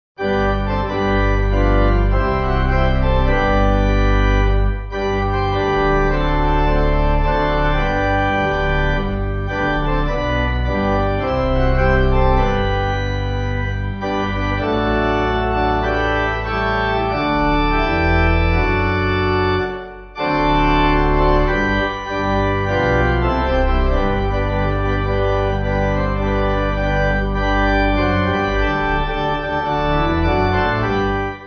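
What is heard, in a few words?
Pipe organ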